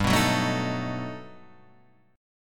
G Augmented Major 9th, Guitar, Standard; G+M9 strummed; JGuitar

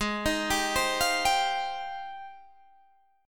Ab Major 13th, Guitar, Open G

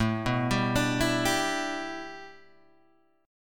A 7th Suspended 2nd Suspended 4th Guitar Open G

